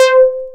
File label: MOOG, Source Bass